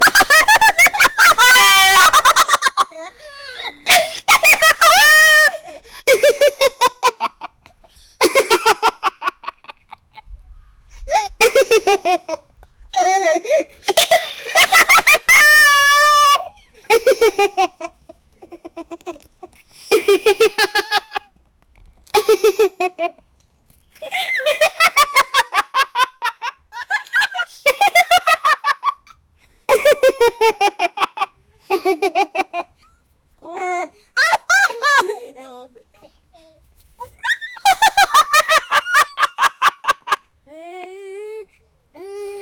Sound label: a 16 year old young, laughter